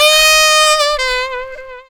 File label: SAX RIF; E-Lab; X Static Goldmine 2; akai; SAX RIFF2